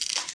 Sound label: cut pomegranate